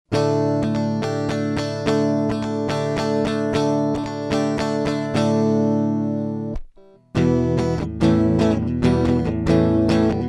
Golden Age Project Pre 73 Jr MKII Gtr Gain 30 Out max Air 0 HPF 0